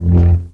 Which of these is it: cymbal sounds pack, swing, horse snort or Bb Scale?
swing